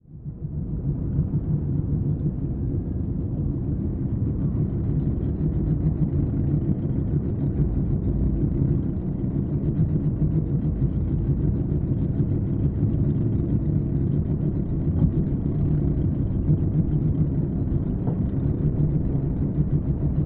Underwater Water Ambience Boat Motor Idle Rev Engine